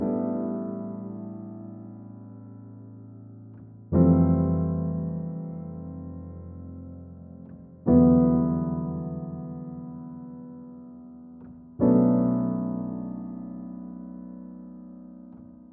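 122 BPM Piano Chord Progressions Cinematic Drama C major A minor Tonic B; MMB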